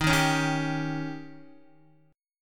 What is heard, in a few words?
Eb 7th, Guitar; Eb7 strummed; JGuitar